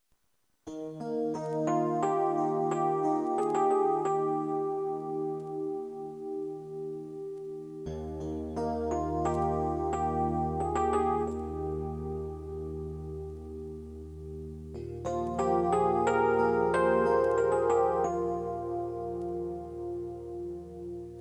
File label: drummer